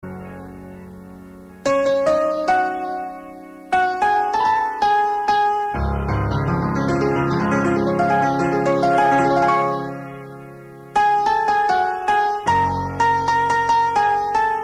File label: Sad Tone; Dilwale